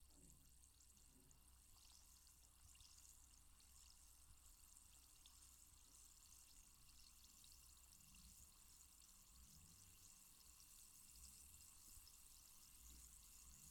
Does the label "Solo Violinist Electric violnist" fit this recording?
No